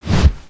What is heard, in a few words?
jet pack sweetener; emotes